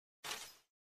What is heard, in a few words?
Windows 7 recycle bin sound effect